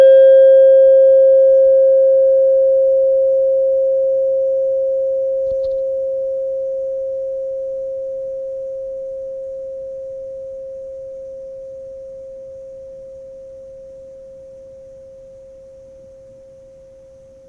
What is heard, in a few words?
tuning fork decay